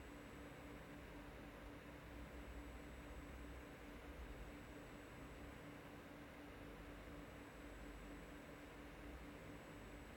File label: noise